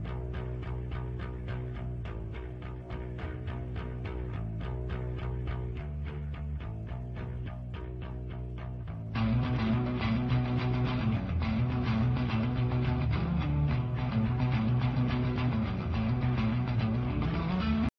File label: bass battle